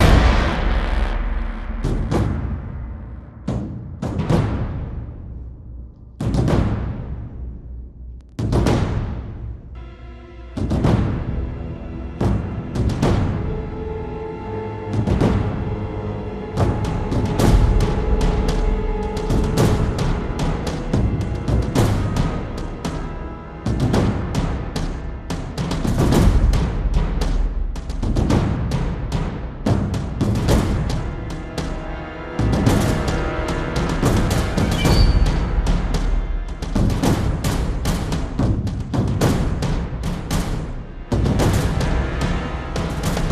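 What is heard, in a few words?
music perc loop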